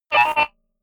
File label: notification synth wobble once, signale